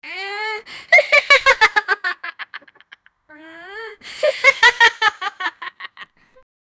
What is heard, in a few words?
a cute girl laughing